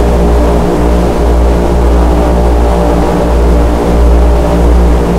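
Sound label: b17 cockpit left, engines; War Thunder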